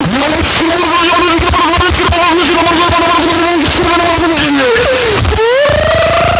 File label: zombie pain